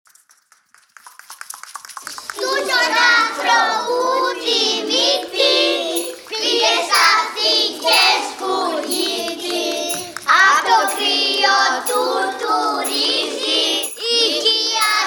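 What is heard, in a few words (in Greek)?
Τραγουδάκι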